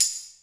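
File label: percussion, Drum & Bass; Ueberschall; Drum & Bass Resonance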